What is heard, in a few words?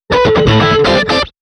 nibbles bpm 24; M8; random and out of place